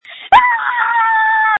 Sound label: screams